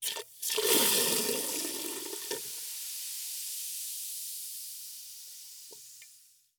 Burst, glass, release, bottle, spray, jet, fizz, cistern, full, stifle; Eiravaein Sound